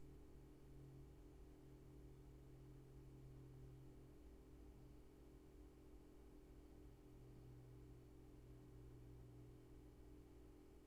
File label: roomtone